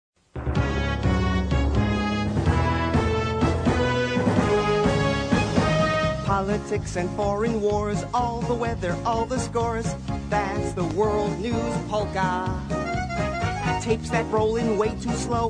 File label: Polka; World News 1998